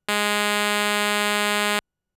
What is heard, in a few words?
Pulse B G#2, keys; fleet keys Samples